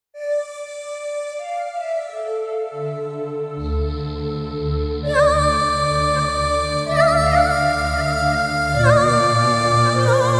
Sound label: Mountain voices